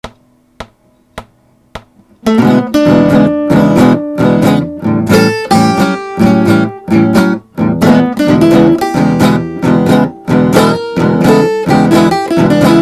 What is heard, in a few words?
Blues 2 Guitars